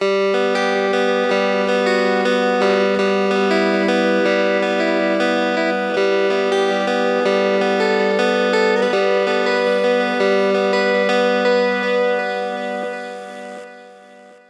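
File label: Guitar Riff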